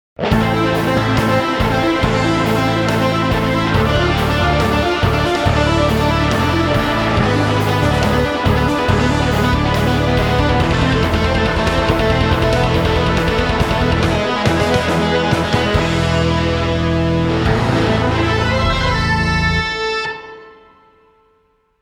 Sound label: Neoclassical Metal